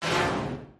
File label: hit; no longer alone sfx